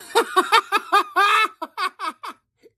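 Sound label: male laughter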